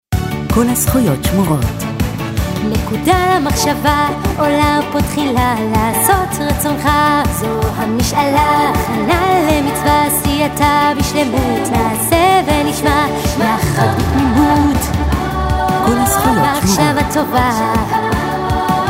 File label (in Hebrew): גינגל